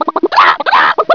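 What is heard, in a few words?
chicken alarmed, ambience, mountain hamlet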